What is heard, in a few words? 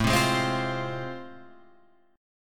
A Minor Major 7th Sharp 5th, Guitar Open F; strummed; AmM7#5; JGuitar